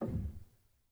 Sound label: rel, Grand Piano; bitKlavier Grand; Piano Bar